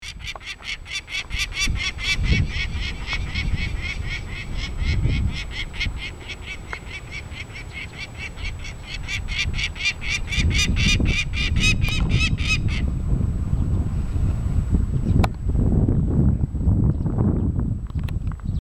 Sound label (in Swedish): Pilgrimsfalk